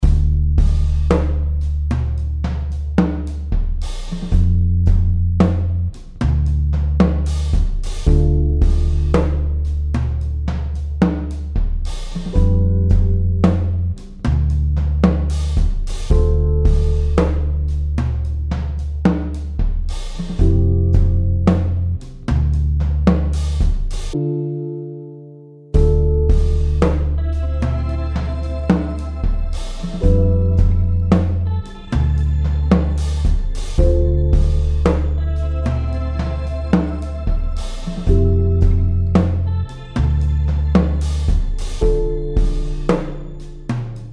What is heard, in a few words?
truck drums